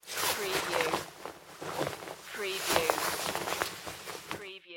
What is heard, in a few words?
Cloth Coat Take Off